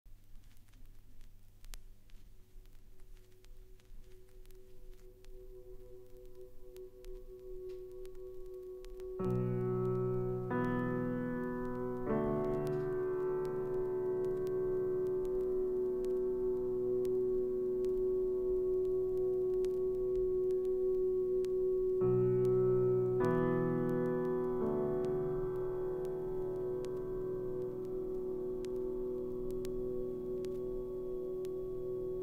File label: Scottish Jazz